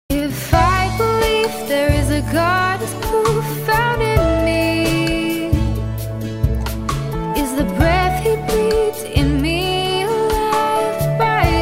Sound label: Choir